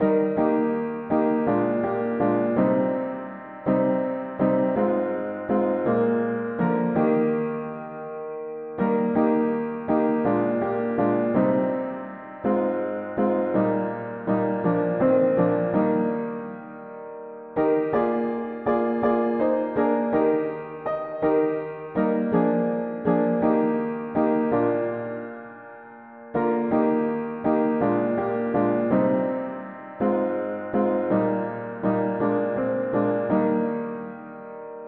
mono piano; hymns; O Jesus Lord we love Thee

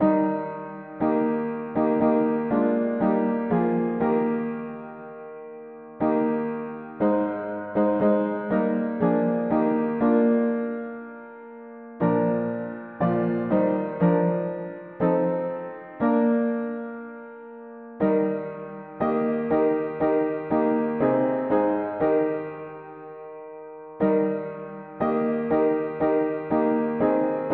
mono-piano, hymns; O Thou Who didst Thy glory leave

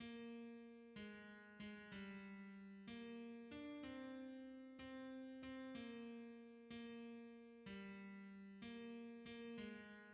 tenor; C est notre Dieu; hymns

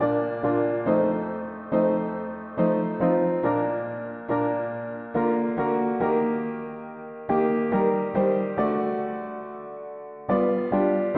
mono piano; Jesus calls us over the tumult; hymns

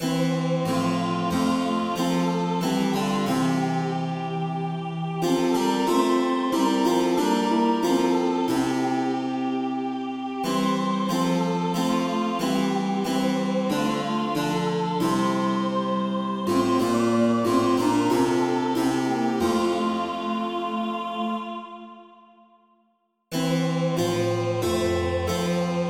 polyinstru; hymns; Break forth and sing the song

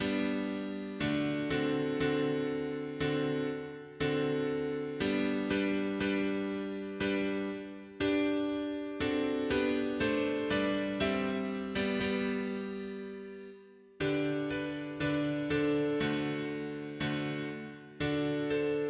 mono piano, hymns; Source feconde salut du monde

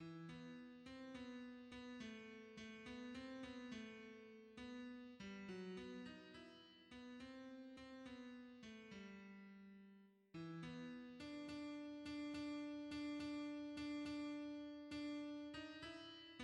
tenor, hymns; O jour d angoisse

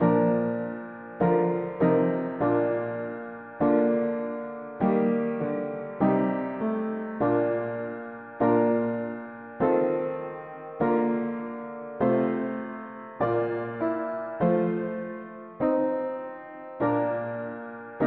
mono piano, hymns; High in the Father s house above

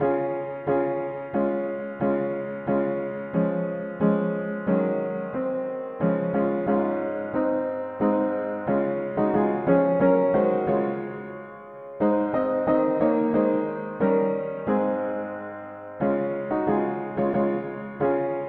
mono-piano, hymns; What rich eternal burst of praise

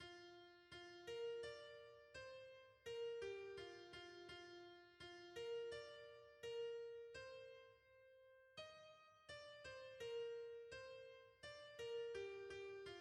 soprano; Ton amour demeure le theme; hymns

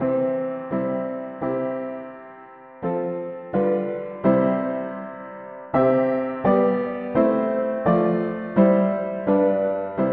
mono piano, hymns; Jesus toi Dieu fort

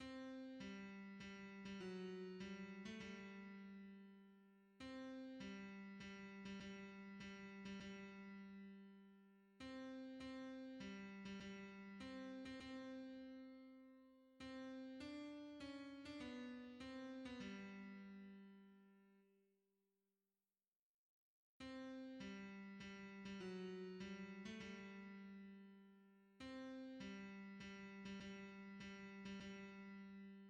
hymns, tenor

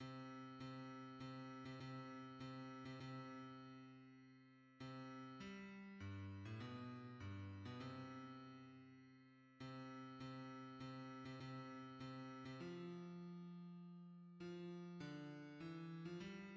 hymns, bass

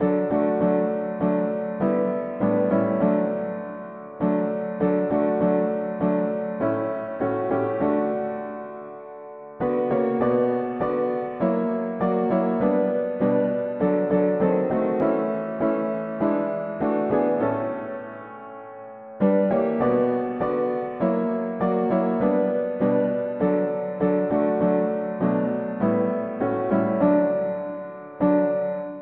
mono piano, hymns

